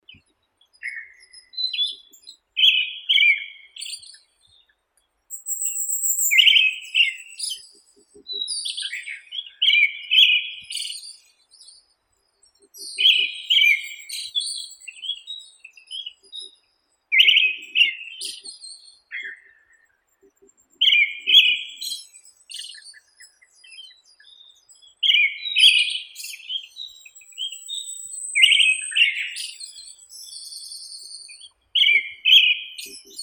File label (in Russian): drozd zolotistii turdus chrysolaus